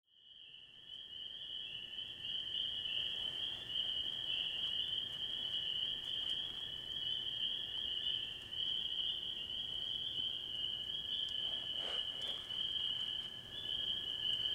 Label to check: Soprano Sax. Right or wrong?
Wrong